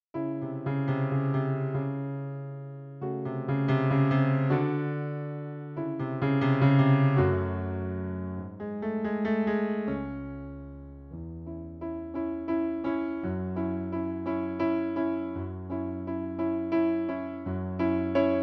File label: Piano